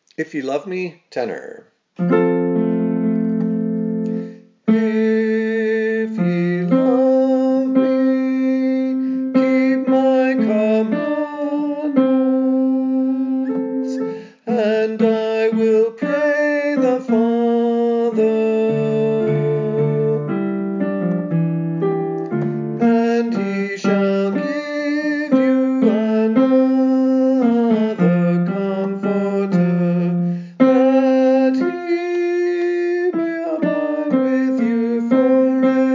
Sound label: Tenor; Tallis; If ye love me